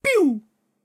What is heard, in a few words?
laser guns; SyrinScape; Syrinscape unplugged; Jungleplanet dinolaser battle